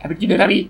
weird noise; jerma

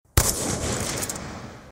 dsr sniper sfx

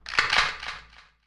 shotgun reload; pizza tower

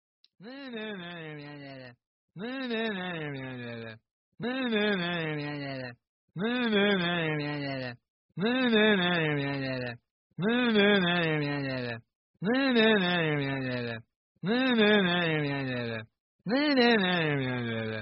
tv news channel music; bloxburg; roblox

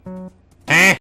heh; technoblade

